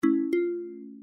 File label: drag